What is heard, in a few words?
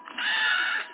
scream